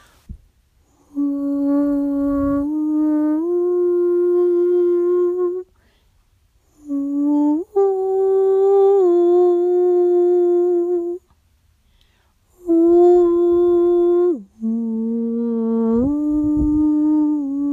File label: Humming All Shall Be Well